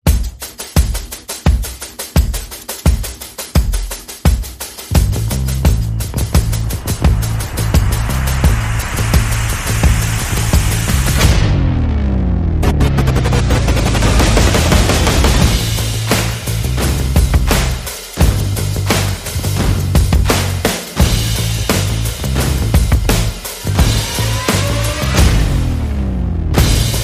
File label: Percussion Bass and Effects